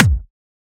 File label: Bpm140 Bm Kicks; DarkShadow